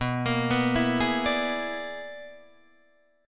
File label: B-Minor Major 13th B down Guitar DADGAD; BmM13 arpeggiated